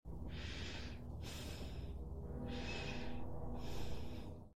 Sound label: girl breathing; lethal company